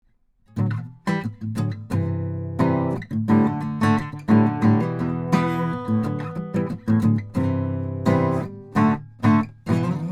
Harmonic; MK8s LDM

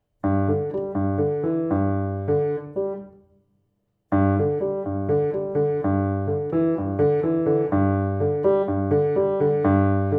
Piano; AMI KM100f

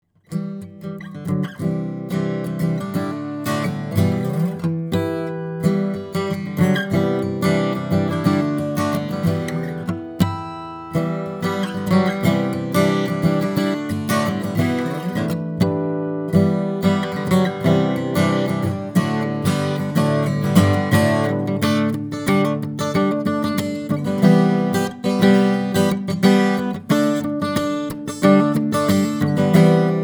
Harmonics; AMI KM100f

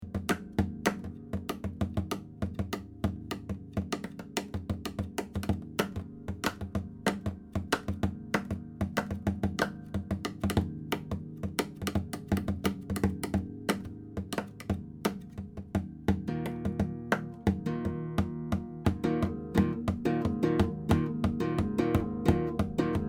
Drums; Ramirez 1970F

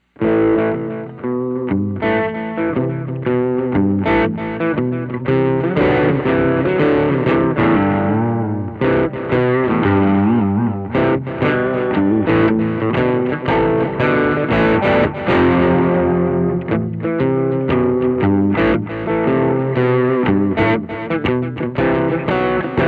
Dog Walk, Gibson ES225; Dog Walk / (Original, in Am); MP3s were made in Logic, with no EQ or effects

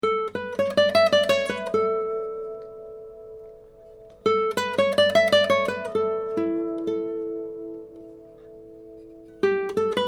Resonance; BARTOLEX; nylon-string guitar

